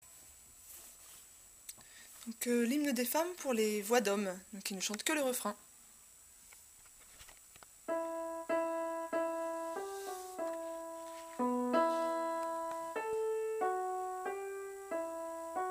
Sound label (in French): hymne hommes; chant; Hymne des femmes; L’Hymne des Femmes est un chant qui est apparu lors du Mouvement de Libération des Femmes des années 70.